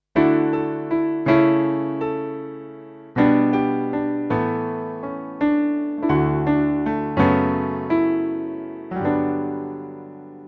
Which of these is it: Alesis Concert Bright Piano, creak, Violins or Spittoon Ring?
Alesis Concert Bright Piano